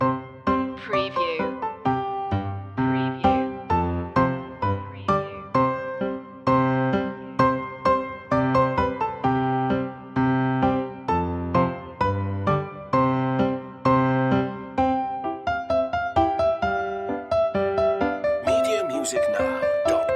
piano loop long, Christmassy solo piano arrangement; ding dong merrily on high; christmas traditional